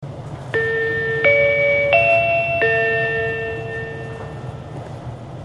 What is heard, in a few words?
chime